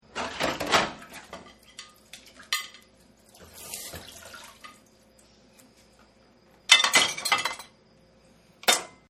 Wash Dishes; Appliances; Household; Partners In Rhyme

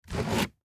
Laundry Detergent, Detergent Pick Up; Household; Blastwave

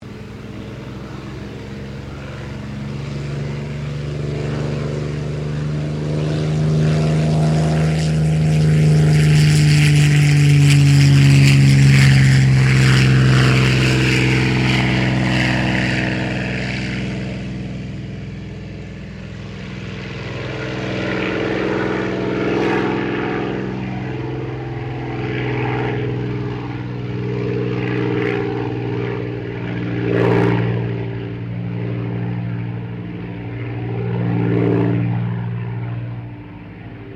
AIRCRAFT SUPERMARINE SPITFIRE MK-VIII TAKEOFF; Airplanes; Vehicles; Airplane Sound Effects

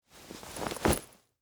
Winter Coat Adjust, Clothes, Movement; Foley; Dressing Sound Effects; SoundEffects-BlastWave

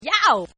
Woman YOW OO; Exclamations; Voice

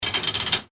Chain Metal Clanging; Metal Sound Effects; LYN103 package of Chain Sounds; Lynne Music

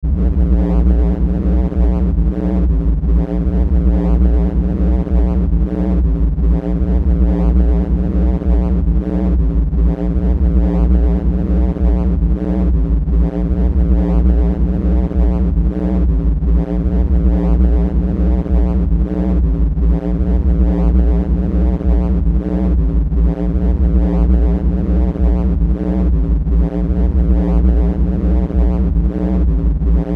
Bed Low Electrical Buzz Fuzz; Bed Sound Effects

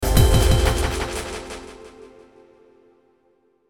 Hit Drums Pad Delay; Blastwave

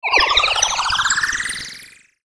Logo Rising Blurp; Sonic Logo; Blastwave

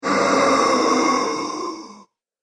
Ghostly Halloween Monster

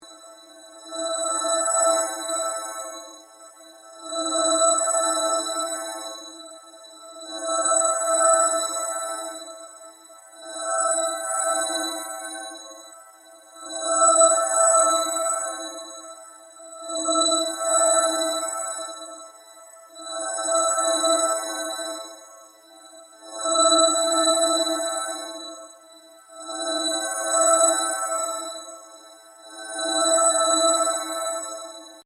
Ambient Drone Slow Sweep Electric; Sound effects created by manipulating other sounds

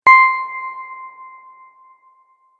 Tap Play, button; multimedia

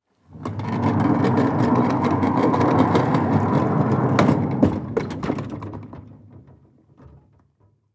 Cart Roll By Cement Long; Rolling; foley; Objects; Blastwave

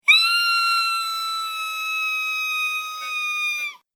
Scream Human Female; Screaming Sound Effects

